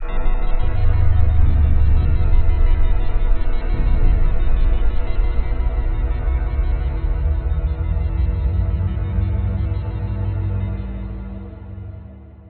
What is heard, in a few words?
Dark Synth, cinematic drama soundbeds; musicradar